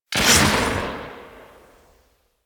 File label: Grenade launcher Tail; Warframe; Weapons; Corpus